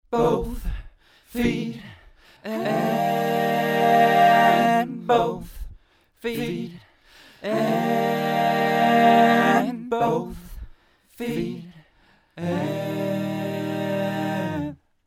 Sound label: wrong backing vocal; 时间错位的伴唱; Waves Sync Vx